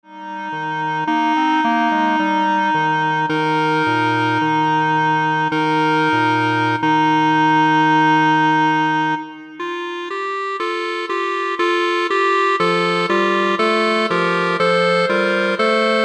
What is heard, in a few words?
Clarinet Ensemble